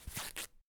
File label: bandage end; Escape from Tarkov